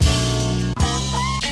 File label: two stabbers, Stabs